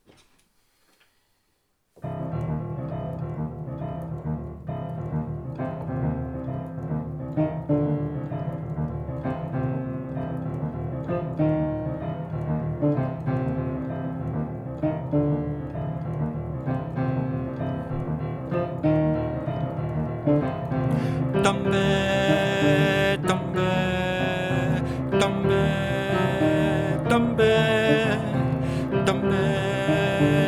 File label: Herstal TEN